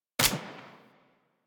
Arch Dual Pistol 2D Mech; Weapons; Warframe; Archwing

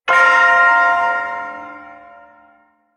Shawzin Hirajoshi Chord; Warframe; Duviri Ersatz Shawzin

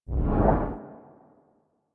Vitruvian Museum Exit; Warframe

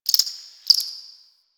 Cricket

